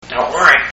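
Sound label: "dont worry", parrot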